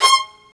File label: STR MRCVL0MR, Roland LA Composer strings; Roland - LA Composer vol. 1